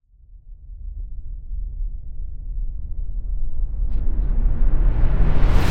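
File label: reverse, Cinematic Metal Impacts; Endless Doom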